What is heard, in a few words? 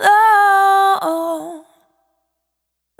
Urban, Single Voice Melodic Loops, Vocal Samples; Pocket Diva World Class Vocal Samples